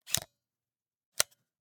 Camera, Pentax KS2, DSLR, mechanical, flash up, close, XY; Eiravaein Works; Vaeyan IV